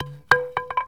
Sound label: Baseball Bat Fall